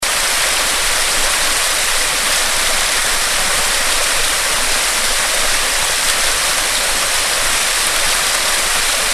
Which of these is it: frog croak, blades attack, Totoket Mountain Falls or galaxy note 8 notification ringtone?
Totoket Mountain Falls